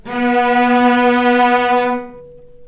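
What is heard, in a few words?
cellos attack B3 R, String; arco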